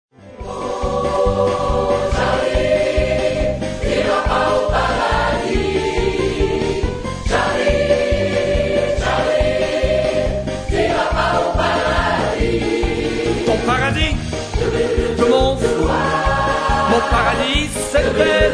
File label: SATB Solo